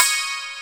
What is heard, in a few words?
YANG-CH'IN; AKAI S6000 CD-ROM - Volume 1